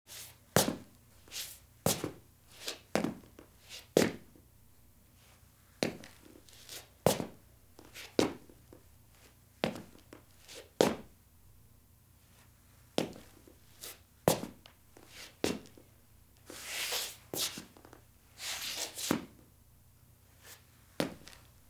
foot scrape male concrete full; Zomboid 41.78.16; Project Zomboid

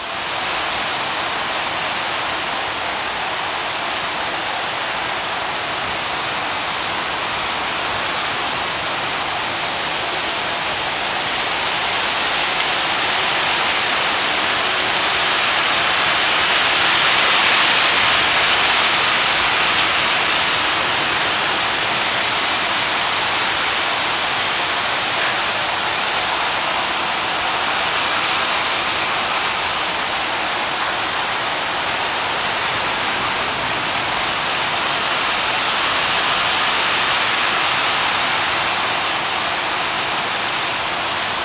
heavy Rain; Zomboid 41.78.16; Project Zomboid

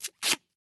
Clothes Ripping; Zomboid 41.78.16; Project Zomboid

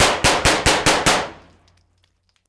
assault rifle distant; Zomboid 41.78.16; Project Zomboid

